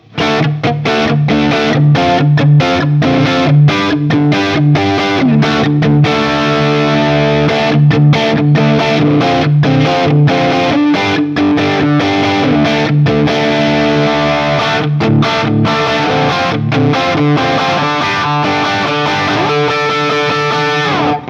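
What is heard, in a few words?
Guild S285 JCM800 A Barre, guitar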